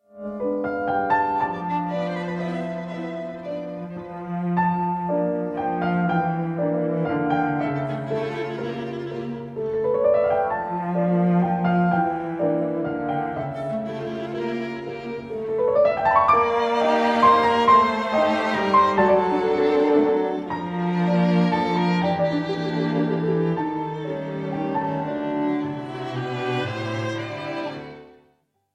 string quartet, quintet in g minor; piers lane; sergei ivanovich taneyev; goldner string quartet

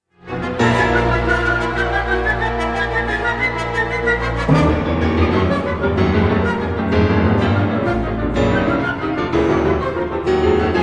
concerto no 2 for piano; Berliner Philharmoniker; Sir Simon Rattle; Sz95

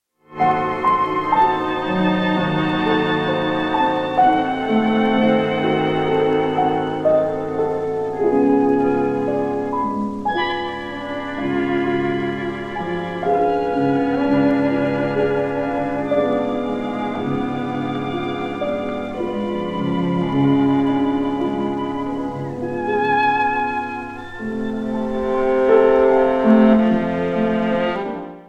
piano quintet in A minor; harriet cohen; edward elgar; stratton string quartet; op84

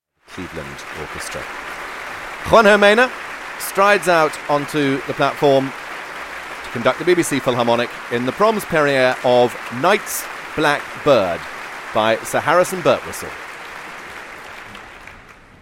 nights black bird for orchestra; Sir Harrison Birtwistle

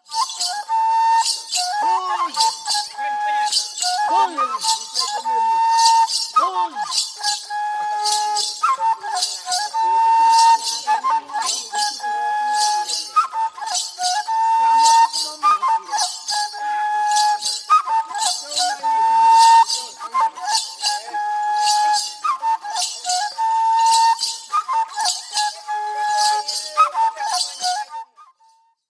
traditional senegalese bassari chants flutes

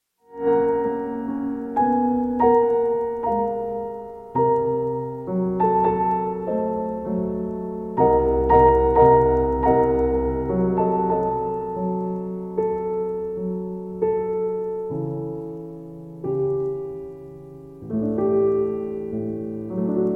improvisation no 5 in d minor; sir edward elgar

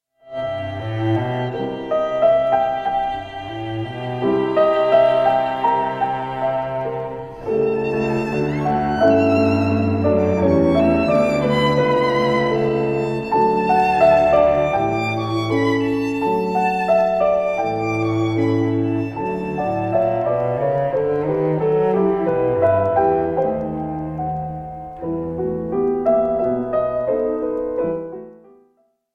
piano trio in D minor; fanny mendelssohn; dartington piano trio; lied; op11

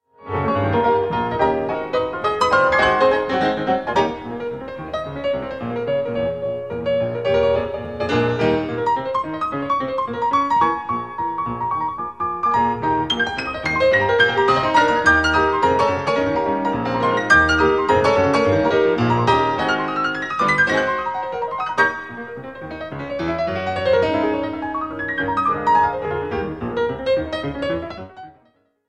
honkytonk syncopated impression for 2 pianos; arr jeanneau

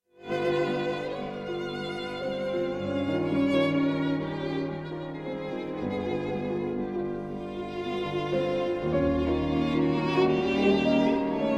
quintet in f minor for piano and strings

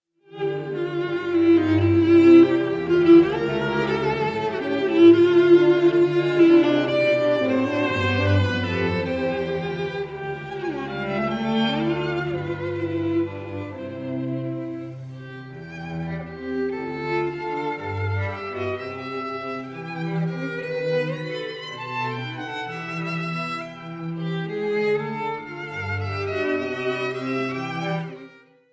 arr for string quartet; Edward Elgar; arr Cassidy; op 17; orig violin and piano

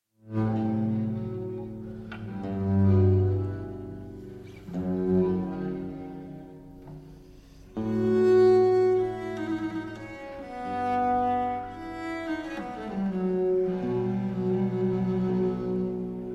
symphonie concertante for 2 violins orchestra; symphonie concertante ml ed airs patriotiques; 1st mvt

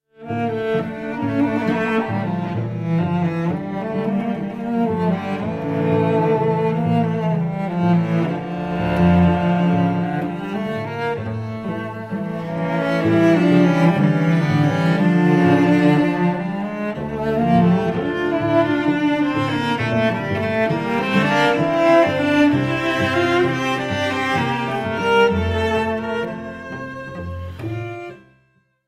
song cycle for voice and piano; Schwanengesang; D957

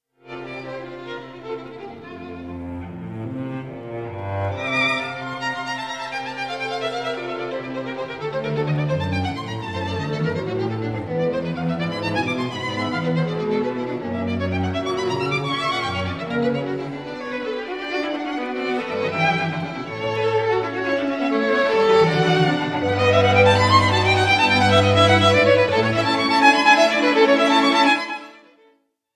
4th movement allegretto from string quartet; Wolfgang Amadeus Mozart; in D major

